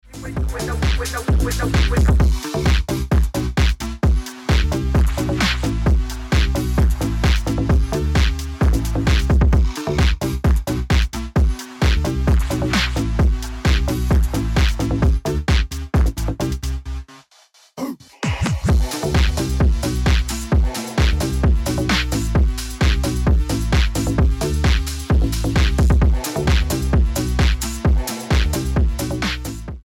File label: Pop Phonk Dope Ringtone